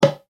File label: bongo